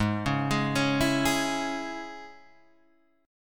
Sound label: G sharp Suspended 4th Sharp 5th Guitar Half Step Down chord, down